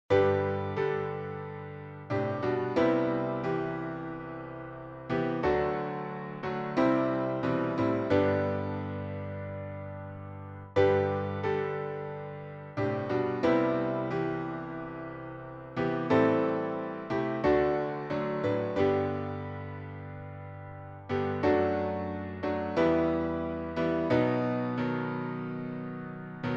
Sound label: instrumental